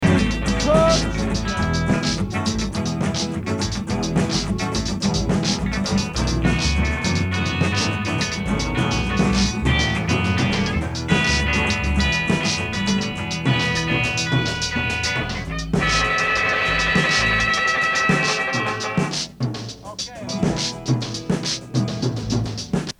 ( Guitar Part )

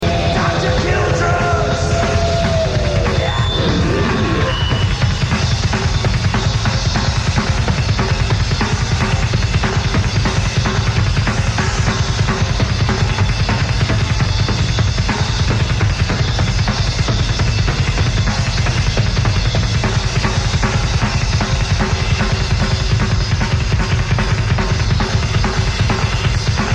Drum Solo Live

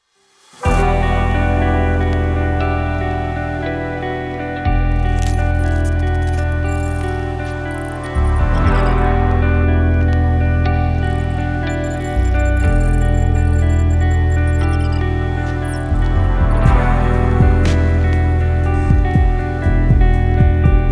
Instrumental; SHIFT UP; STELLAR BLADE ORIGINAL SOUNDTRACK

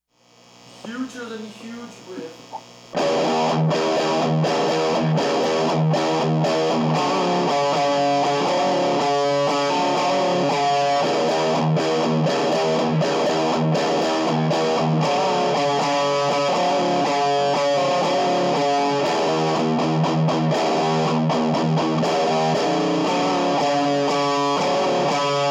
Riff

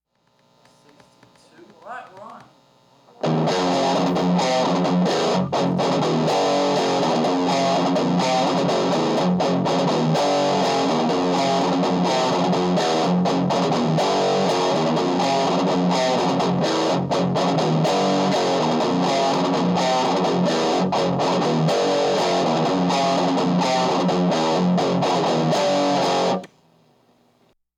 Riff II

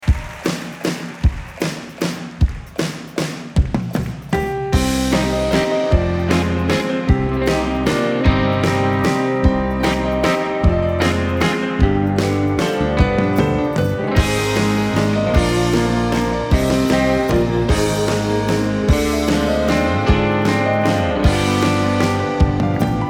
Instrumental Live

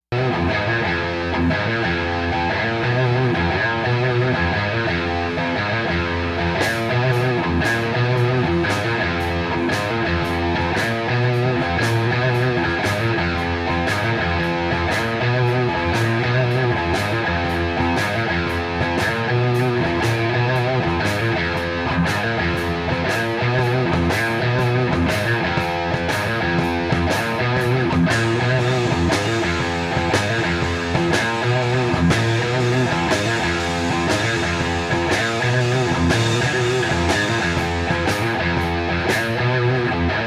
Riff

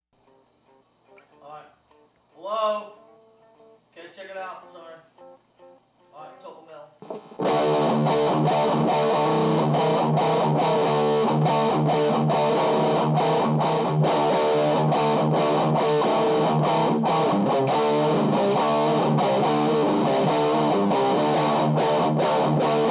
riff

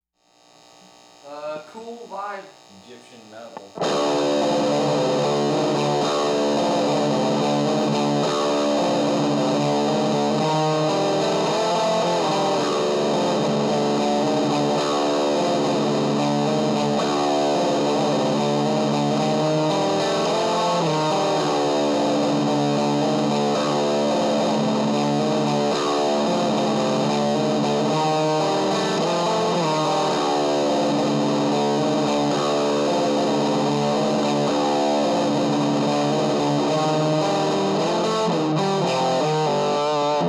riff

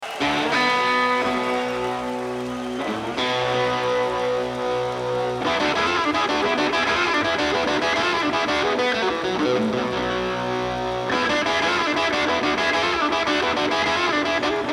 (Live)

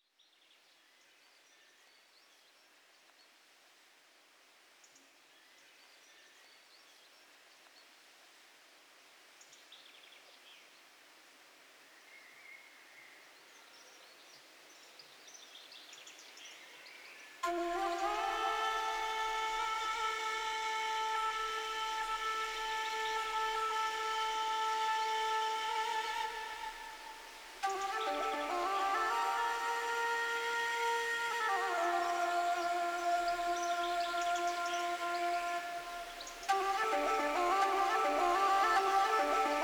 Birds & Wind Instruments

